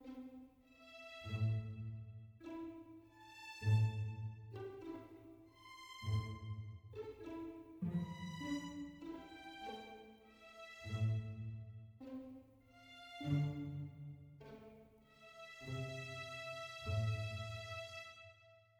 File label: mysterious soft